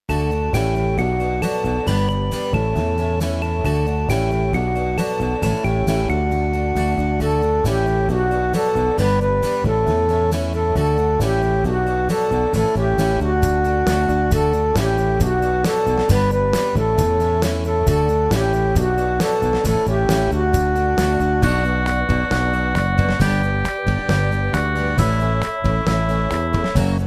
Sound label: God goes up with shouts of joy BACKING